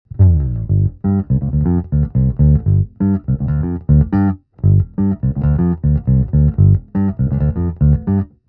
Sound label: BASS DRY